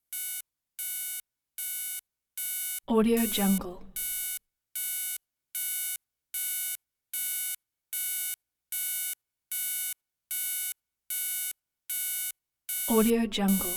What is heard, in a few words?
beeps alarm repeating constant flashing graphic